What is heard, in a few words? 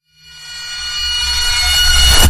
Reverse Sparks